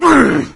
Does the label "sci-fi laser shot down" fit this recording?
No